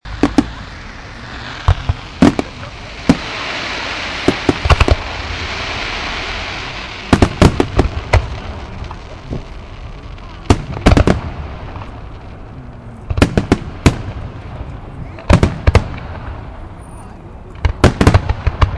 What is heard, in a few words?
end of T in the park fireworks